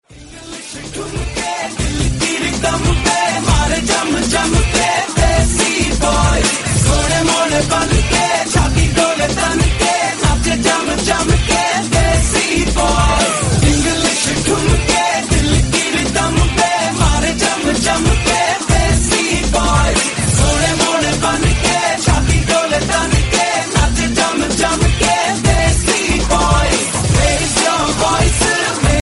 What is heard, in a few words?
dance song tone ringtone, bollywood ringtones